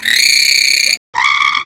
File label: thriller horror; best service Blue Box; mixed sounds short